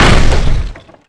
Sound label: Crash; FSR 2011